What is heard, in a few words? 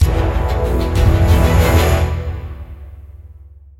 Battle Spring Intro; AFK Journey; Android